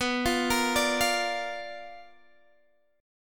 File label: B Minor Major 7th Flat 5th B, Guitar, Open D